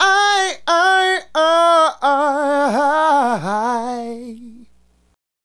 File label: Adlib, Hip-Hop and RnB Vocals